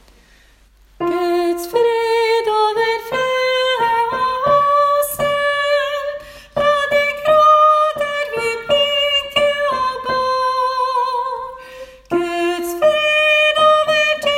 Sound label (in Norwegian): korpsversjon sopran; nordnorsk; åfjord